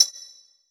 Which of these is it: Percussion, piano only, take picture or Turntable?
Percussion